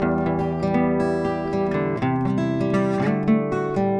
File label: acoustic guitar